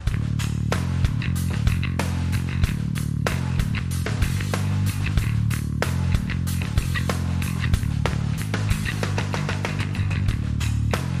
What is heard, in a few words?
drums bass